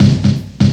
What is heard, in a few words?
FILL DRUM; USB Soundscan vol.27; FILLACOUS